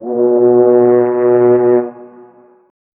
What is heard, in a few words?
deep sound of a foghorn, harbor